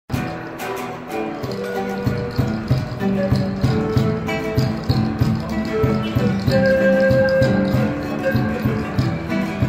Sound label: Cusco Busker